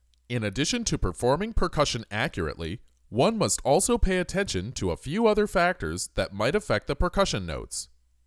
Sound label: Percussion